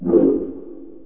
FAN; JOHNNY

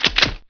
reload

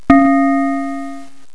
BELL NOTE

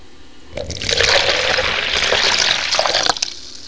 POURING, WATER

